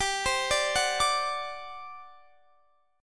G Major 7th Suspended 4th, Guitar, Standard; GM7sus4; arpeggiated; x,22,22,19,19,22; down; capo=3

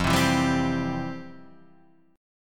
F Major 7th Suspended 2nd, F, guitar; FM7sus2 Chord {1 3 2 0 1 3} strummed

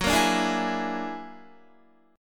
F sharp Augmented 9th F sharp Guitar; F#+9 strummed; 13,13,14,13,16,16

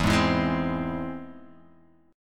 E Augmented, Guitar, Half Step Down

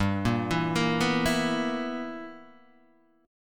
B Major 7th Suspended 4th Sharp 5th G

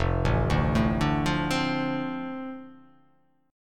F 6th F down, chord; F6 arpeggiated

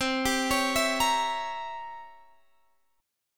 C 7th Flat 9th C Guitar Drop D

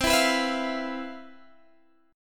C Major 11th C, Guitar Standard; CM11 strummed; JGuitar

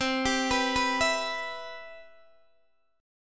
C Major 7th, Guitar DADGAD

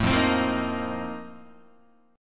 F 11th F, down, Guitar; F11 strummed

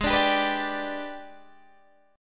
G sharp Minor Major 7th Flat 5th, Guitar Standard; strummed; JGuitar

